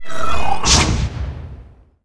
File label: Entrap Flower Bomb; Seer; GamigoNA